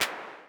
Clap Reverb, Vermona DRM1-MK2

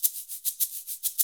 Shakers

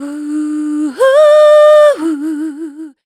VOC F, Dance Vocals, FEMALE

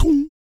VOC PERC, Vocal Planet Jazz FX BASS PERC; Spectrasonics Vocal Planet

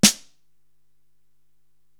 SD Orchestral, Korg TR-Rack drum machine

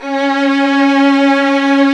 VIOLINS; E-MU Formula 4000 Series Vol. 3 – Analog Odyssey; Vintage Keys

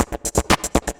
Cut up 808, Drum Loops

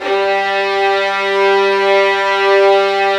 Violins, Arco; Arco Violins III

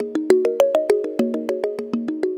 Tongue Mallet Echo; Melodic; Ambient-Melodics-II

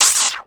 SFX, Drum & Bass, 160 BPM; Ueberschall; Drum & Bass Resonance

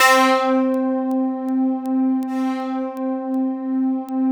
C3 FM, Synth Line; 90 s Sample CDs; NiceBeats; SynthLine VOL-20

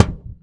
Hype, Kicks; Airwindows

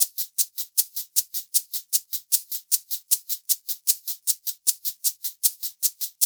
LOOP, SHAKERS

